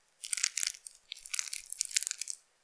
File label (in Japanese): 普通, 挿入 or 引き抜かれ終わる直前; ■挿入or引き抜く